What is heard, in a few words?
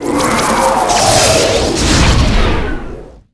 Ice field